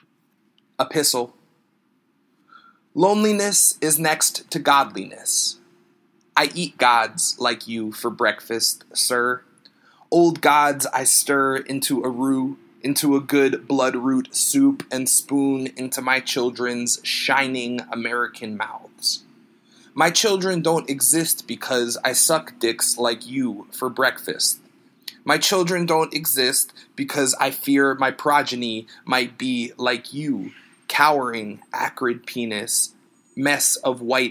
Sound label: sax